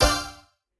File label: scatter short; spinsane